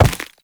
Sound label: Step Ice; Warframe; Uriel